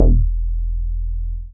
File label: BAS MINI, Mini-Moog